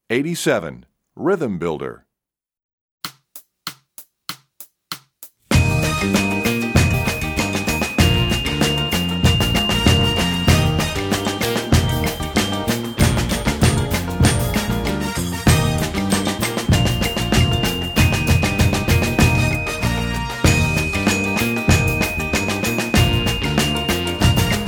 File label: Rhythm Builder, Percussion; EE2000 Book1